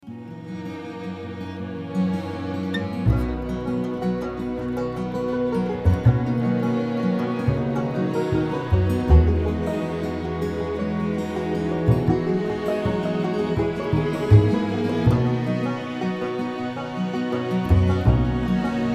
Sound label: pizz bass ending